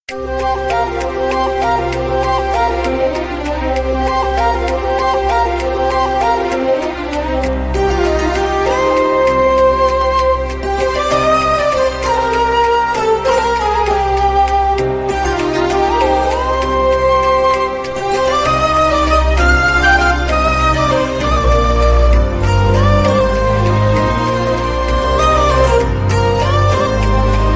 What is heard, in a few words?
bgm ringtone; tamil; maari 2